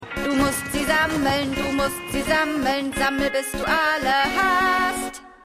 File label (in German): Funbag Werbegesang; Futurama; Hulu